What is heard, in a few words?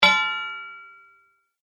Metal Low Hit; rock